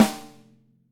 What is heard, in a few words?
snare; osu